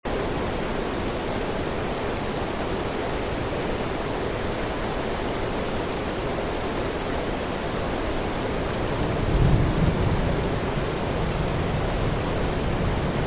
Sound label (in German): Green Noise (Grünes Rauschen); 8 Stunden; Ambient-Track mit realistischen Geräuschen; Zenspannung